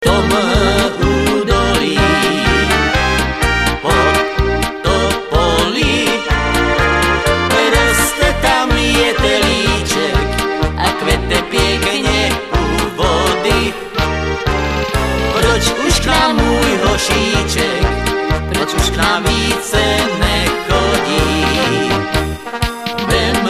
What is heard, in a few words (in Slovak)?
akord